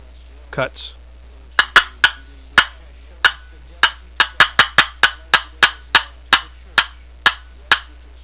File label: poplar cutts, turkey